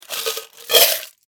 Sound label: Foley, Coin, Cup, Empty; Money; Objects; BlastWave